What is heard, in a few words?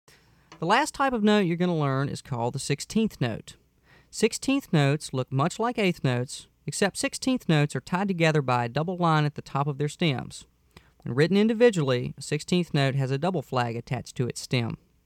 Sixteenth Notes